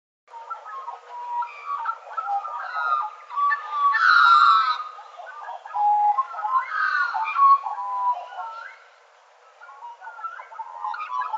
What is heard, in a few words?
gymnorhina tibicen